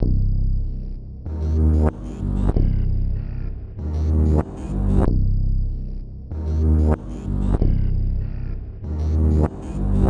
cinematic warehouse Synth Cm 95bpm

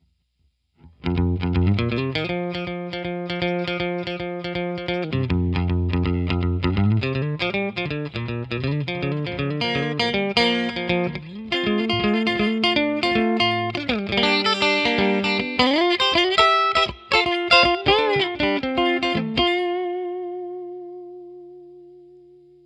Choo Choo Lead Guitar

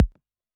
808 BD short, Roland-808 Drum Hits Bass Drum

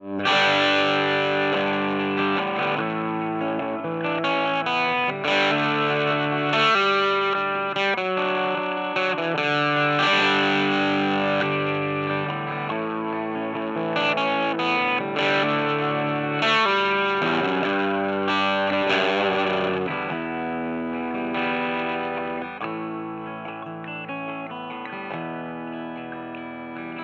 Elec Gtr

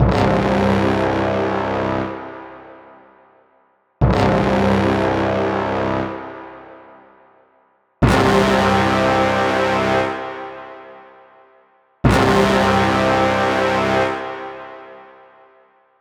Orchestral Brass Stabs C, Cinematic Trailer Loops

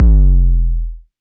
808 BD long, Roland-808 Drum Hits Bass Drum

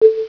boogn, spacey

